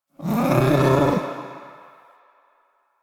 Ambient Meow; Warframe; Kalymos

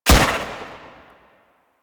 Fairy Weapon Shot; DE; Warframe

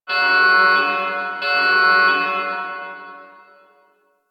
Core Room Alarm; Warframe; Ambience; Core Rooms

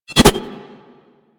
Tonfa Parry Whoosh; Warframe; Tenno

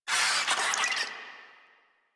Infested Ashen Vocal Attack; Warframe

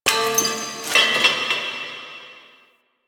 Metal Debris; Warframe; Quests; TheNewWar; Ambience; Zariman

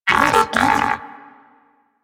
Agree, Moa Pet Tenacious; Warframe; Pets

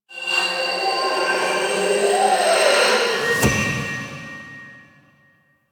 Wisp Twirl; Duviri; Intro Execution; Courtyard

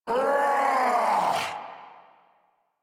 Attack Roar, Boss; Sargas Ruk

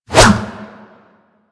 Staff Swing D; Warframe

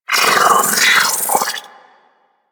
Sentient Battalyst Vocal Attack; Warframe; Sentients

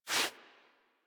Foley Idle Paw Swipe Quick; Enemies; Kubrow; Warframe

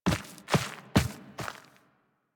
Intro Steps, Forest Night End; Operator; Warframe; Sacrifice

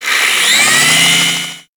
Space Pod Melee Arm Start, Vehicles; Grineer; Warframe

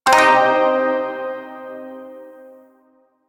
Shawzin Pent Min Chord L, Music; Chords

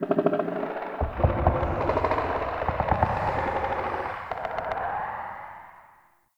Cave Trippy Knock, Ambience; One Offs; Neathuns Root Cave

